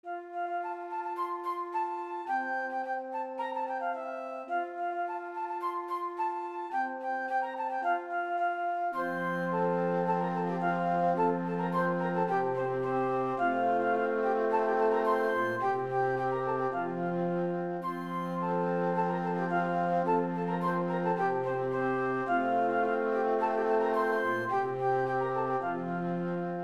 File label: flute